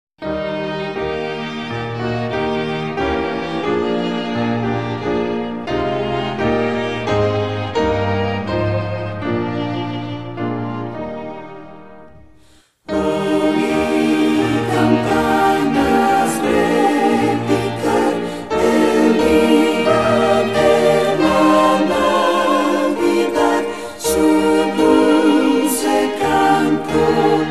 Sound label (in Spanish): campanas de navidad